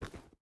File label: footstep rubber boots forest walk; Rust